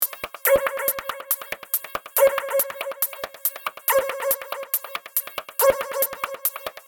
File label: E Drum acid beat loop